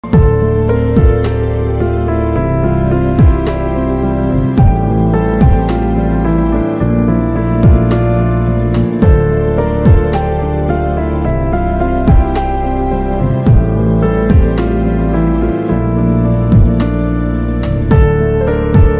instrumental ringtone romantic ringtone love ringtone mobile ringtone